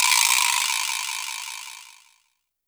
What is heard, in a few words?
Vibra; Roland R-70; Perc